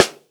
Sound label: Snare Stick; Lightning1024